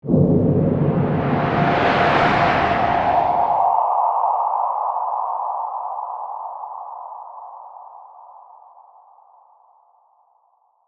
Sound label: Ghostly Ethereal, Horror, Ghostly, Ethereal, Swirl; Ghosts; BlastWave